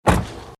Auto Heckklappe schliessen

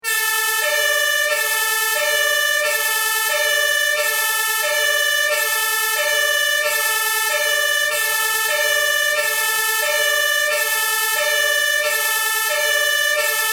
Martinshorn lang

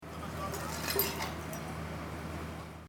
Silvester Scherben

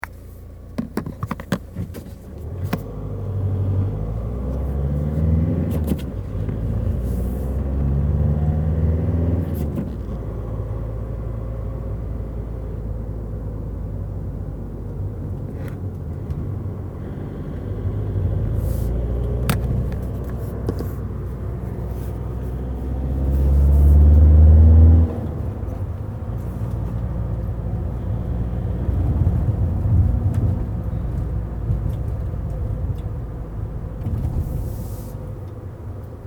Auto innen

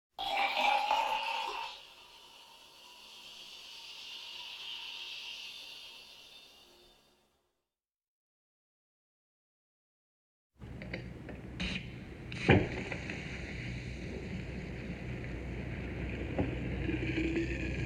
Bei Tisch Flasche Oeffnen Eingiessen

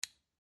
Zuender

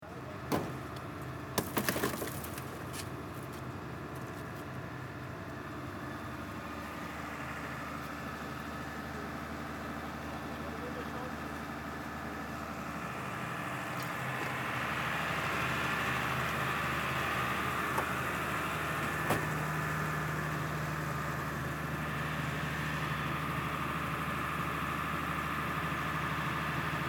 Silvester Feuerwehr

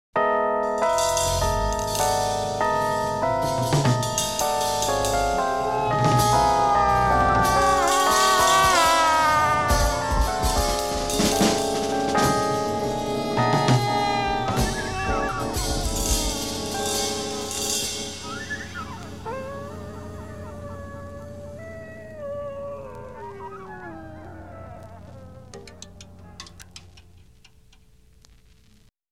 Musikeffekte Unheil

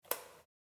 Schalter

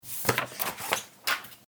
Papier vom Schreibt

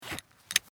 Kleidung Guertelschnalle

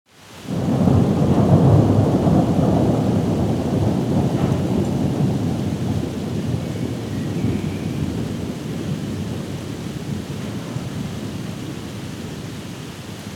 gewitter

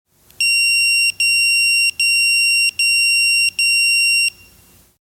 Pieper

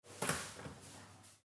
Kleiner Schrank

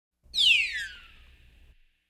Science-Fiction Sternschnuppe

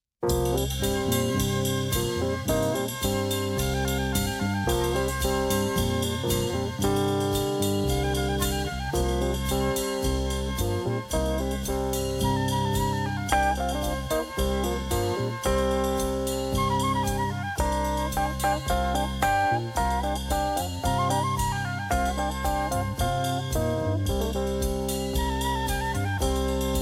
Stimmungen Orientalisch

